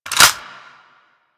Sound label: Weapon Jam Slide A; Grineer